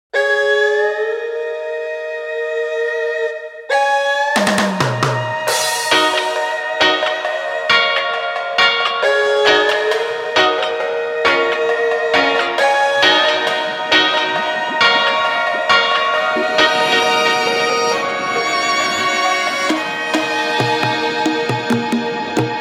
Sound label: dub